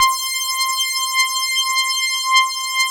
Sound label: DS8 C 5, Korg; Back In Time Records; Korg Universe VOL-1